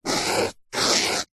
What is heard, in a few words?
foley foam mark score or draw on